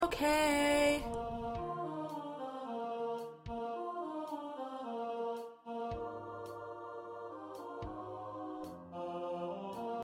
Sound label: Ensemble, choir parts